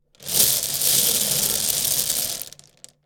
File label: Spin the bingo cage; board game casino mahjong sound effects